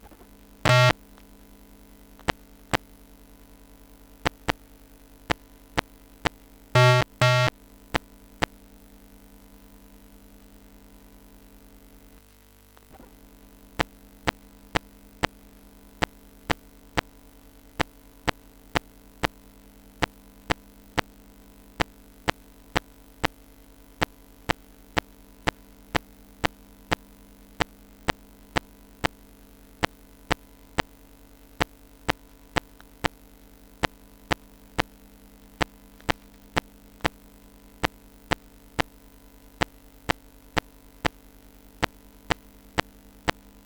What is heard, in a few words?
Floppy Copy; EM Pickup